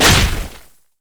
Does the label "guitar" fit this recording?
No